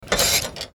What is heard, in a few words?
Bed Move; F.A.M.P.S